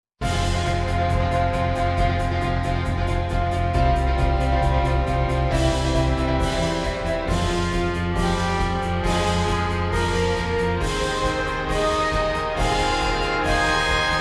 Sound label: backing only